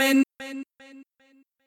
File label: voice